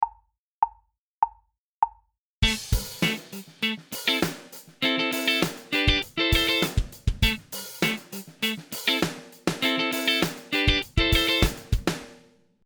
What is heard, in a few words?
Funk Accenting Single note and Triads Am